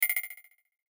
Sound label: Hit Clicks Delay High; Production Element Imaging Element Accent Transition; Blastwave